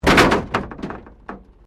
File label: Wreck Door Panel Clunk Metal